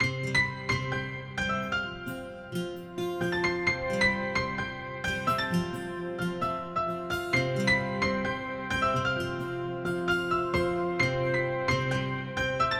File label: Modern Life, ringtones; Honor; Magic-8-Pro